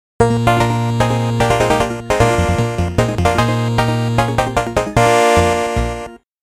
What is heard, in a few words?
Floor Start; HuCARD Disc In Bandai Namco Games vol.2